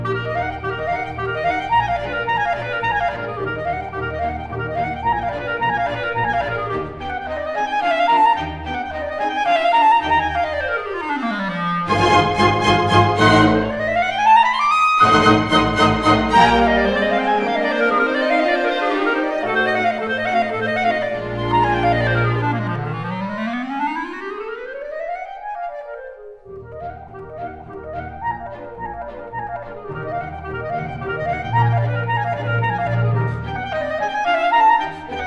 Clarinet Concerto No. 2 in E-Flat Major, Alla Polacca; Op. 74